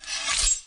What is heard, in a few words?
Food Swoosh; Project Zomboid